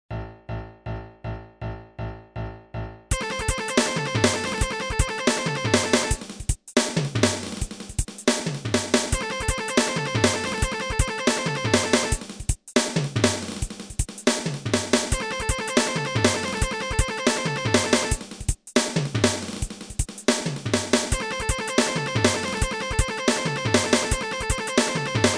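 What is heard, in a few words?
Guitar riff, 160 bpm; speedpick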